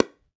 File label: PickelballPaddle Hit Ball Light